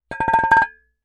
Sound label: Metal; TOOLKIT vol.2